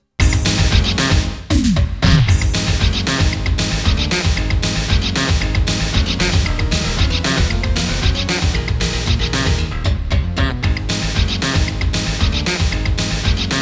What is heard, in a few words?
Bonus; Namco Video Game Graffiti Volume 5